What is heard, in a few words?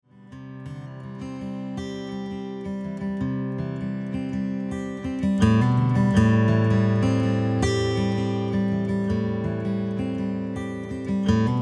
percussion